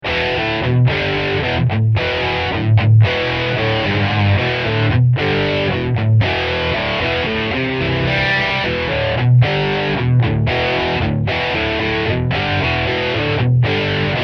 Riff Rear Humbucker

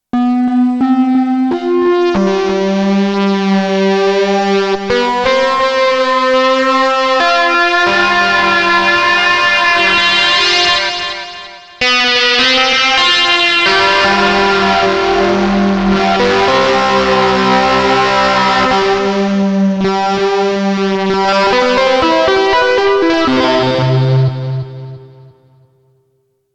8BIT Wavemem Synth FM Mode FM Lv sweep; Sonicware ELZ 1

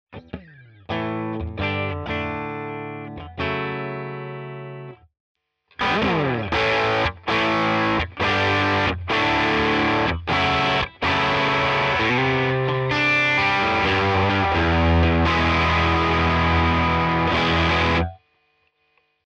Walrus Fundamental DISTORTION Dark

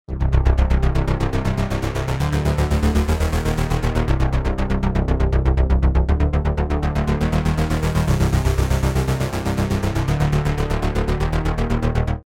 hydrasynth synth

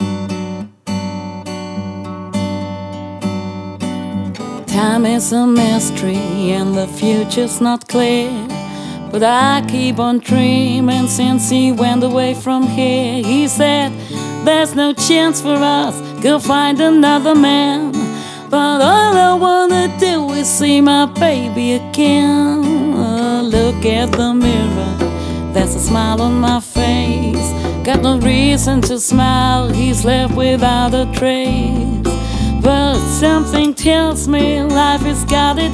Vocal Special; Time is a mystery

